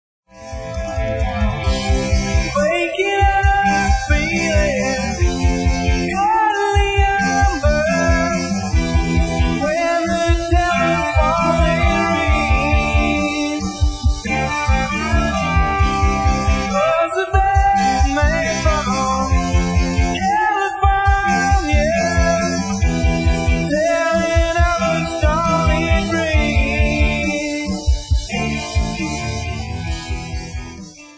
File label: Sin Noise